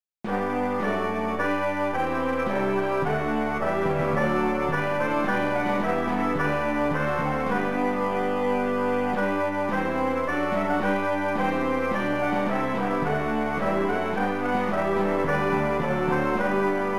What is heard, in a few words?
Hymn Brass